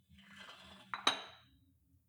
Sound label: weight rack insert weight soft